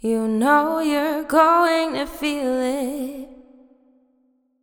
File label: vocal pack wet; ghosthack free vocal pack